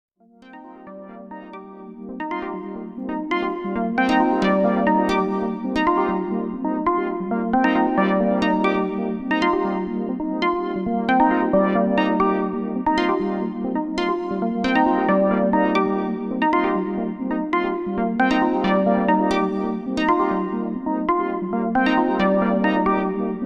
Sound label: Arpeggio with LFO Routed to the VCF